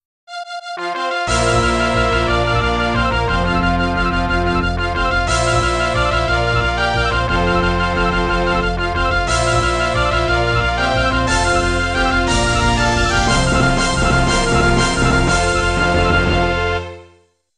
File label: Fanfare; Fanfare from a dream; The fanfare in this dream was clearly inspired by the 1990s TriSta